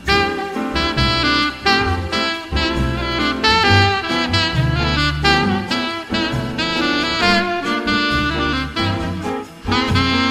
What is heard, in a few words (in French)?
sonnerie